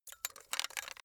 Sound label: metal pipe handling short